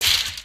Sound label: human step